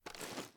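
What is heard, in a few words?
reload movement; gmod; viper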